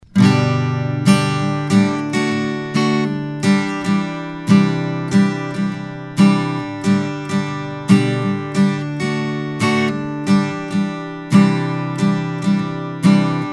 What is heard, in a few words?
Acoustic Guitar I5; mic shootout